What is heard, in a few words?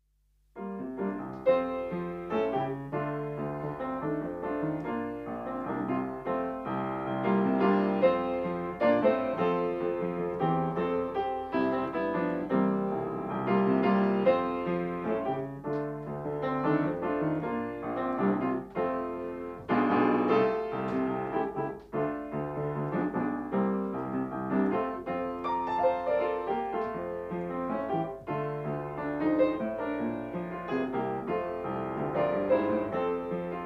piano